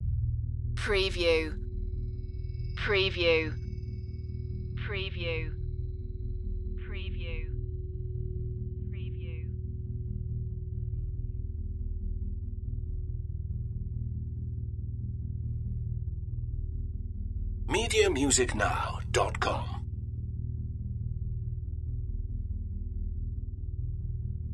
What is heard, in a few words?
dark wood bass